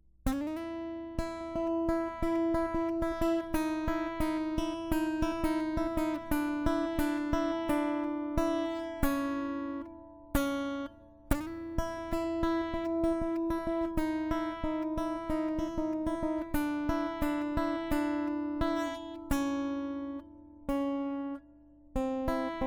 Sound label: acoustic